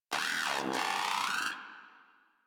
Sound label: Moa Aggressive Vocal Stomp; Warframe